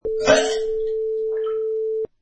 Vomiting

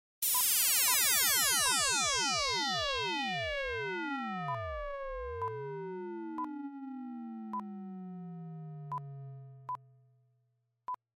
Sound Design Lazor Razor Zapper

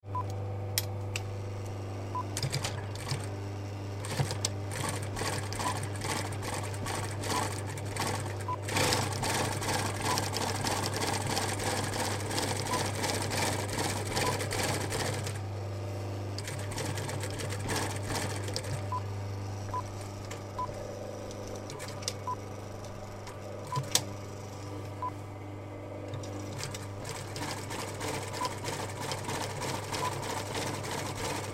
Large Professional Sewing Machine

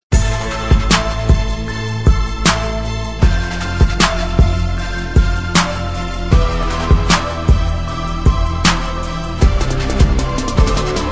Beat rap instrumental; PHATRECORDS

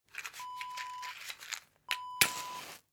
match striking

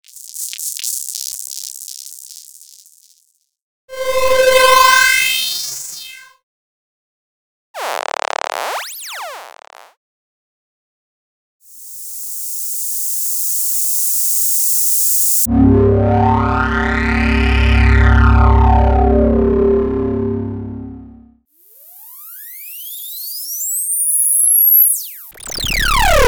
dance fx; dance fx essentials

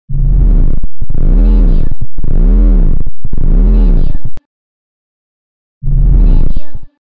Hum Ringtone SMS

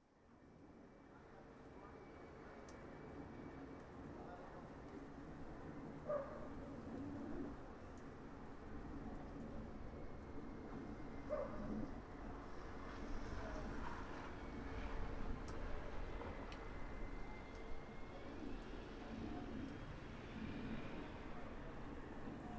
Distant Shoveling

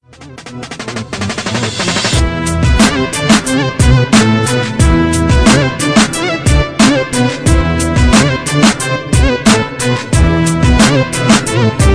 Arabic Flow Beat

